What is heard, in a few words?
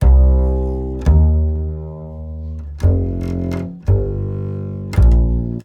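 085 Acid Jazz Doublebass C major; Pro Tools Essential Loops; Digidesign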